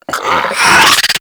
Infested Charger Run Breath; Warframe

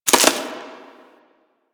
Tree Branch Break; Ambience; One Offs; Warframe; Quests; The New War; Drifter Camp

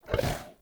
Wolf Land Heavy, Vocals; Animals; Actions

